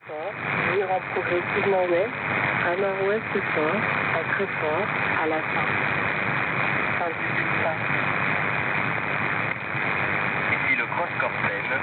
1650 kHz; Cross Corsen; Pointe de Corsen; Francia

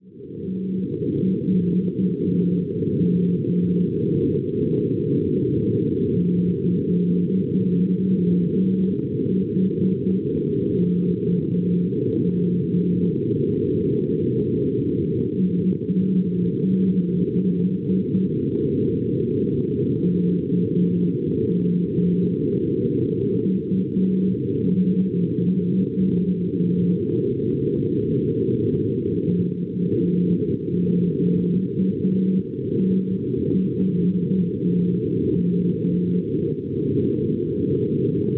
NDB Kalmar Svezia 366 kHz; KM; Schwarzsee

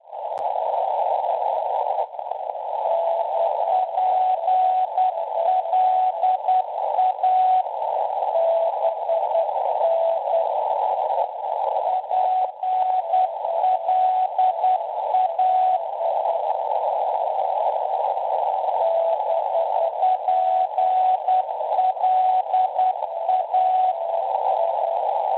NDB, 353 kHz; KIL; Kiel; Schwarzsee

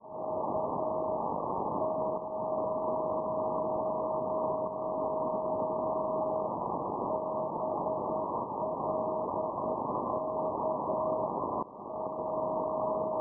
NDB Banja Luka Bosnia-Erzegovina 340 kHz; BLK; Schwarzsee; Perseus

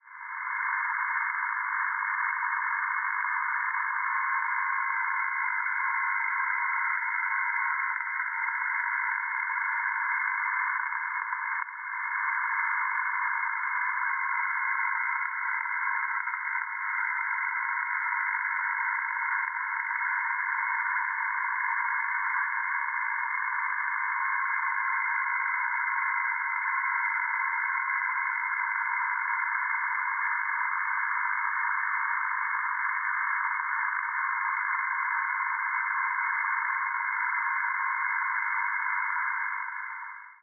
NDB 391 kHz; OKR; Bratislava; Slovacchia; Perseus; Schwarzsee

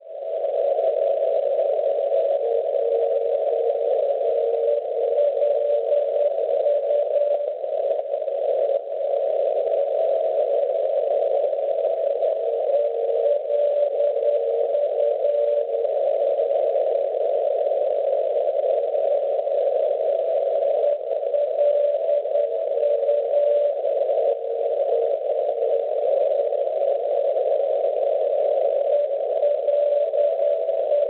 NDB 368 kHz; RK; Copenhagen; Danimarca; Schwarzsee

